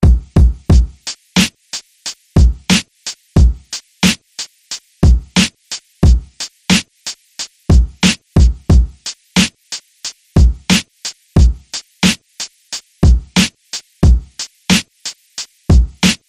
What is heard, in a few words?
Harlem Chill Hop Beat